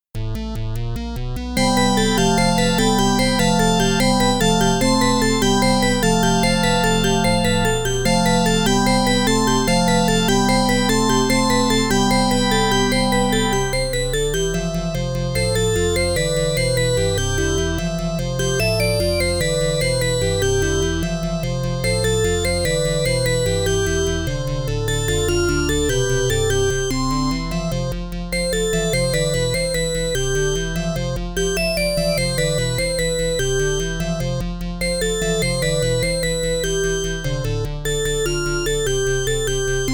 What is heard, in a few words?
tine own keys